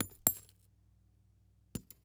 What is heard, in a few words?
Weapon Bullet Shell 3030 Shell Cases Multiple Cases Fall On Dirt; AdobeCC